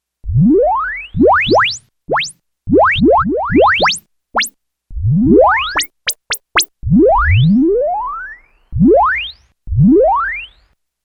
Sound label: Gated FX